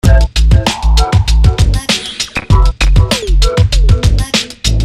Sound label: Loop